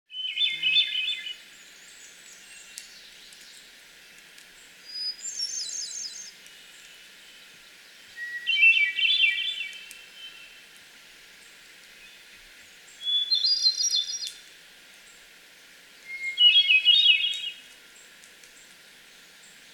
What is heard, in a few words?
Hermit Thrush 12 songs